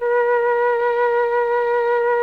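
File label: Mellotron flute 6A#3; Sonic Reality; Vintage Timetraveler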